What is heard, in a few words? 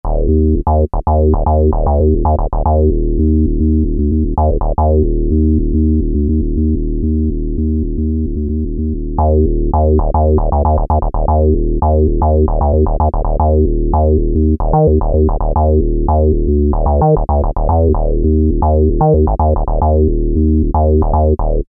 SH-101 Bass; Roland SRX-05 Supreme Dance